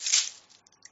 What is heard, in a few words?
coin gain; gardenbloom